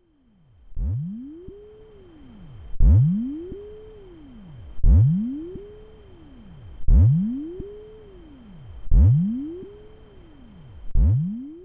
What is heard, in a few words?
sawtooth signal deuterium